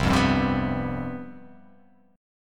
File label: C Major 7th Suspended 4th, down